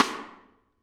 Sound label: R B Rim Close, drums, One Shots; Sonic Foundry; Drums from the Big Room The Mixes